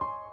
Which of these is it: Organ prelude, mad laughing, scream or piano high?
piano high